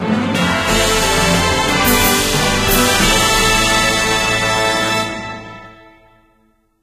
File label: Victory; Dungeon SS2022